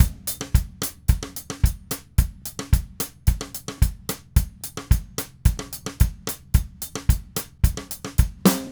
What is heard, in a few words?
Red Maple Groove 110, DW Red Maple Kit